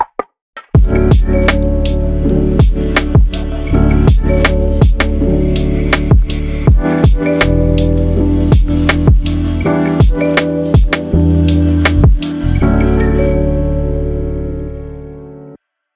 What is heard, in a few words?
relaxation study tape